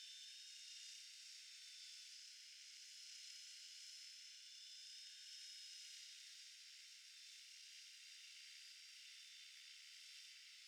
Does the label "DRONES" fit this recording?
Yes